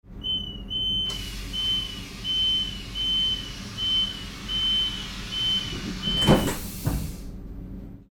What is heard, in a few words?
train door closing with beeping